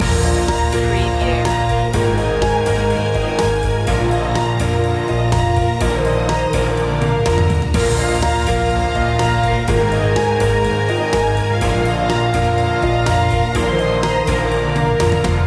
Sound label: daytime stroll loop